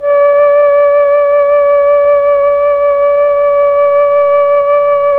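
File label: Mellotron Flute D5; Tron Flutes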